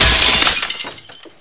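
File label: medium glass, impact; SINDEMO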